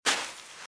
Footsteps - Sand - Dry - Walk - Right; RadwinIsland

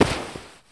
Baseball Dive Grass

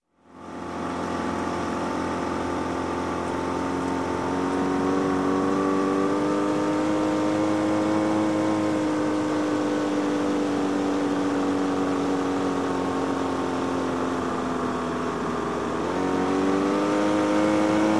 Boat Outboard, 20 Hp - Driving Along, Marine; Sound Ideas; Series 2000

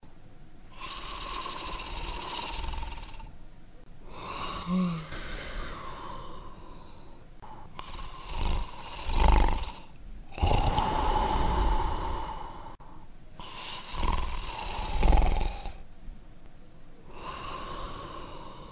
monster sleeping; blindside

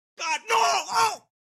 town human road; Blind Drive

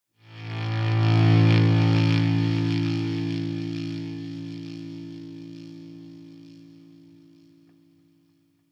Rock Guitar - Fade Away Chord; Hollywood Edge; sound designer's toolkit v2

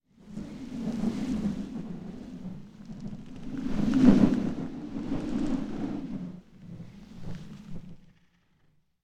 Wind Gust Medium Whoosh Cold Blizzard; Hollywood Edge; sound designer's toolkit v2

